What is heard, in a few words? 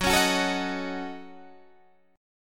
A 6th Flat 5th F sharp, chord